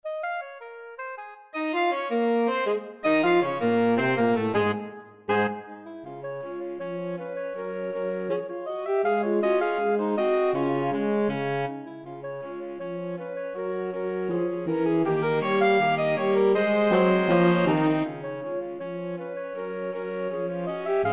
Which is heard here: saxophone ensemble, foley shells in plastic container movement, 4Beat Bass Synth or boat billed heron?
saxophone ensemble